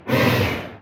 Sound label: unborn growl